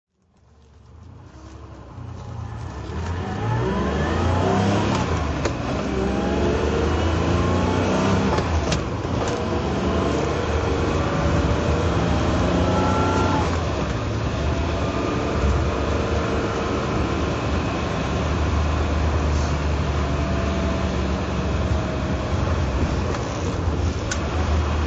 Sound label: drive, Porsche 911T; 1968